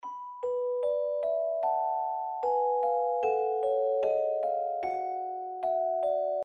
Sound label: Celesta